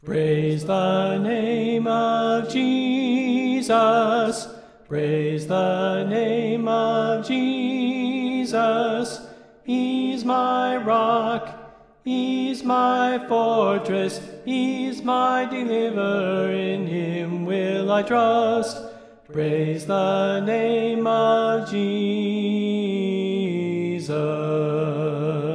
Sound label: Soprano Left